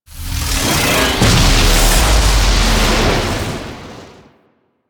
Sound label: Crp Ship Void Teleport From Purg, Corpus Ship Ambience Gameplay; Warframe; Corpus Ship Remaster